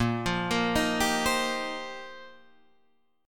F 9th Suspended 4th Bb, chord

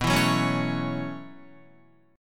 B 7th Suspended 2nd Suspended 4th B down Guitar; strummed

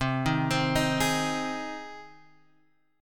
C Minor Sharp 5th C Guitar Open G

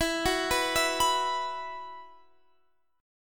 E Suspended 2nd E down Guitar Drop D

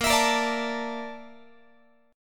A sharp Major 7th Flat 5th A sharp Guitar; strummed

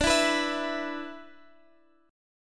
E 5th D; E5/D strummed; JGuitar

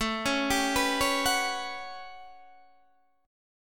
A 13th, Guitar Standard, chord; arpeggiated

